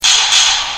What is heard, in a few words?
pistol